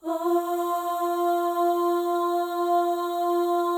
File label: FEM OHS, Symphony of Voices; Spectrasonics